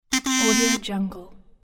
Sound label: kazoo ta da